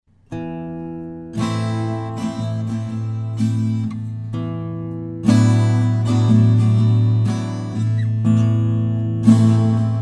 12string

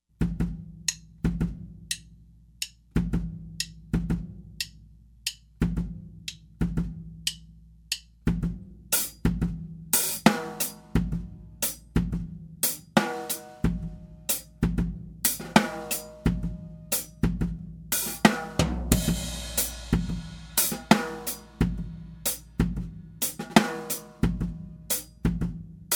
front overhead

